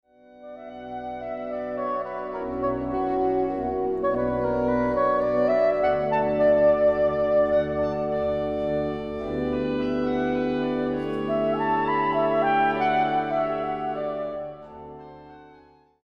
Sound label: Cantilene Pastorale; Guilmant